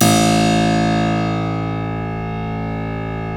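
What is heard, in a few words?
A0 clavinet right, Keyboards Acoustic; USB Soundscan vol.17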